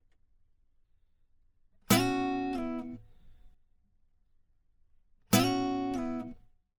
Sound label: 070 Pop Ac-Guitar C#Min; Pop Rock; Acoustic Guitar; Pro Tools Essential Loops; Digidesign